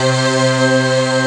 ORG C, organ; Best Service ProSamples vol.10; House; AKAI

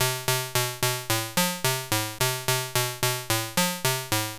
70s Random 109 B, 70s Sequencer Synth Lines; Zero-G Kocktail Kollection

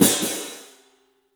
CYFX, EFFECTCYMB; 3D Sound; 3D Drums

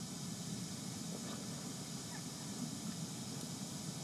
Ambience Loop, outdoor

